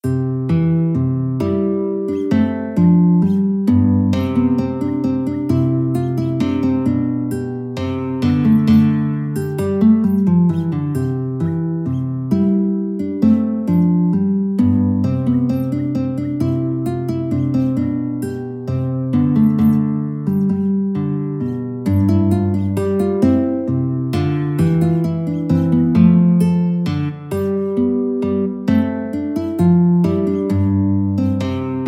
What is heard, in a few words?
minuet, guitar